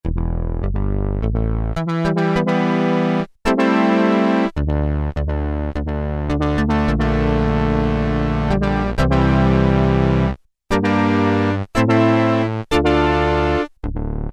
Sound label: Korg Poly-800, Double Tongued Bass